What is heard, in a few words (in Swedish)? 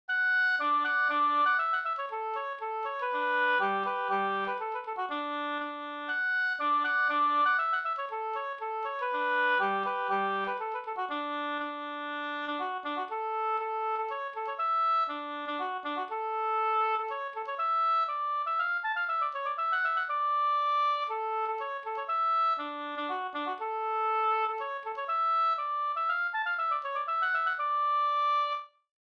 polska Bingsjopolska; Pekkos Pers brudlat